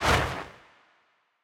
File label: random Wild Whoosh; bloodsuckers2